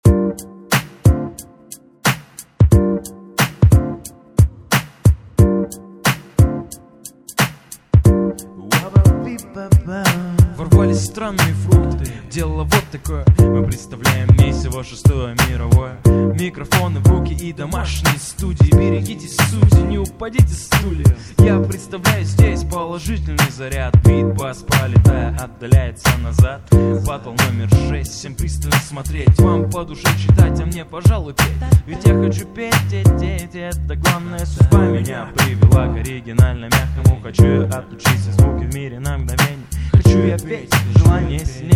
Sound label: hip-hop; otborochnyi tur 6-go batla hip-hop ru